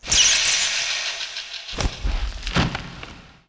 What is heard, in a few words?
Bat die; Seer; GamigoNA